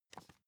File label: magazine page handle